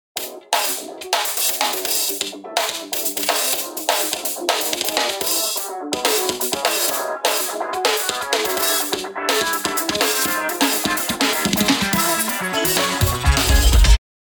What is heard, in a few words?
PRELUDE